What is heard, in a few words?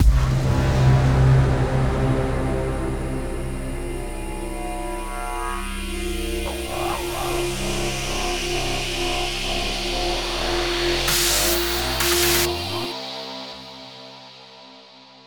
Fill Riser 8 bar A; Megaton Rainfall; PS4